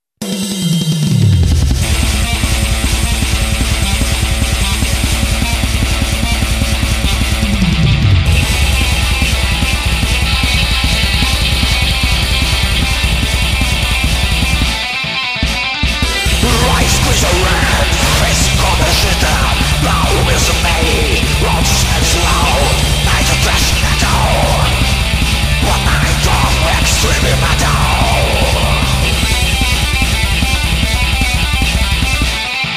thrash metal

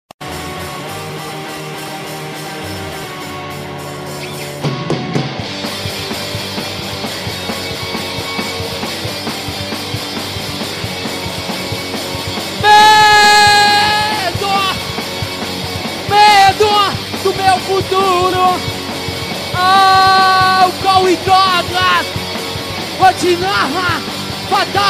cellos

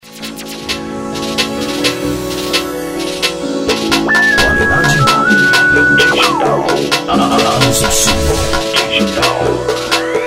groove panic

